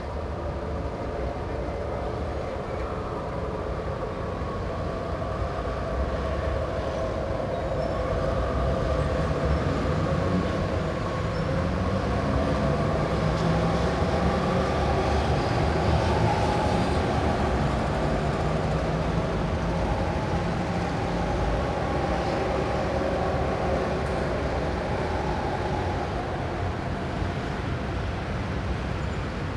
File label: ambience; Street Hoops; XBOX